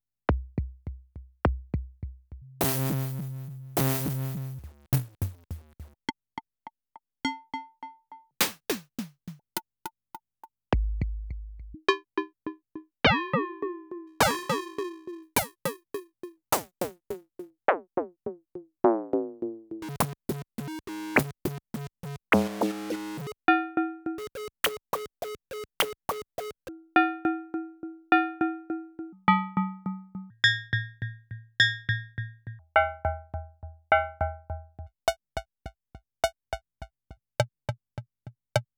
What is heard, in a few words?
Fire Instruments FM